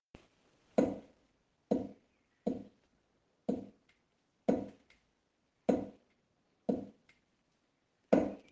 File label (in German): Stuhl kippeln